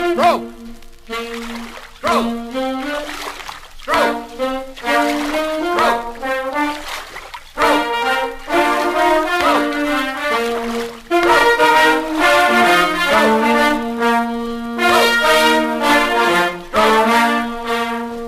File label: row row row your boat ringtone